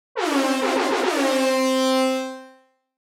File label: Reggae air horn C3 multiple blasts